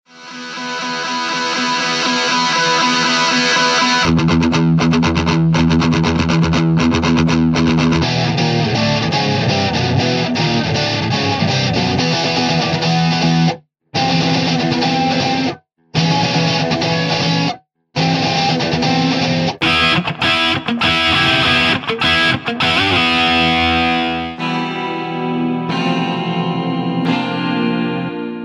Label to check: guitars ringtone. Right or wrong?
Right